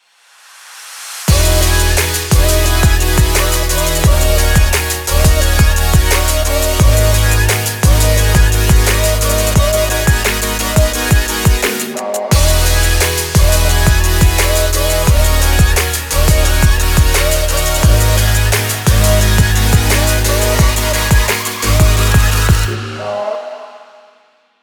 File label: inspiring modern pop